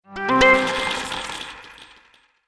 Game Gold Treasure Bonus, Video Game Sound Effects